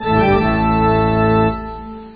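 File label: organ single melody gloom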